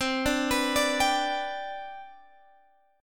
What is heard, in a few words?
C Major 7th Suspended 2nd C down Guitar Open G, chord